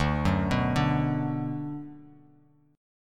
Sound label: D 6th D down, chord